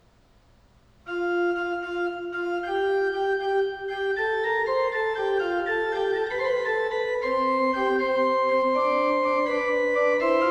Fugue, Organ; Magnificat quinti toni; Johann Pachelbel; Complete Organ Works

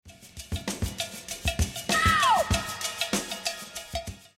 Scream

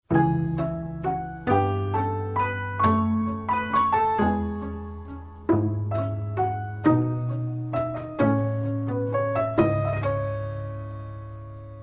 Piano Box

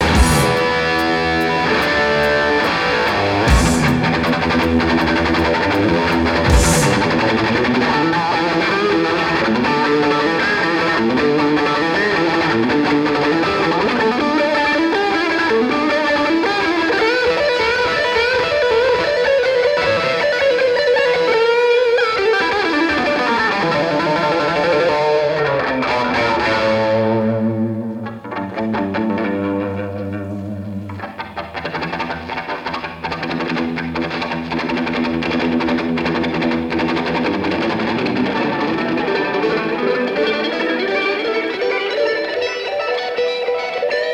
Guitar Solo Live; Live At The Rainbow London March 1974

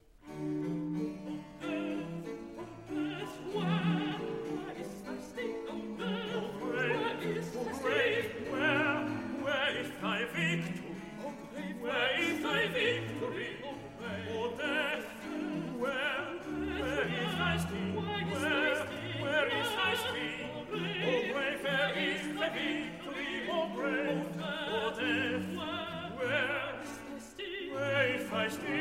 Duet Alto, Tenore; Handel; Messiah; O death where is thy sting

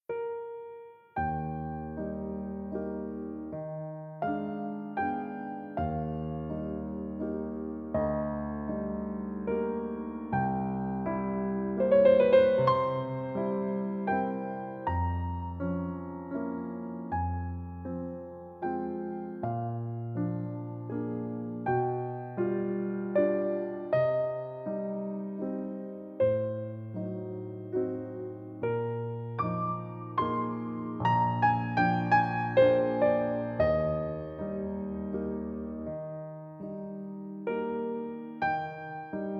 Nocturne in E-Flat Major Op. 9 No. 2, Piano

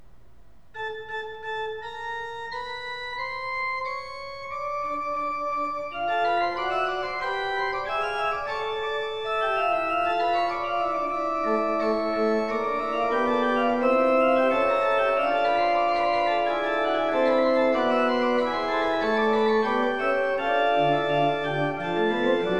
Fugue, Organ; Magnificat primi toni; Johann Pachelbel; Complete Organ Works

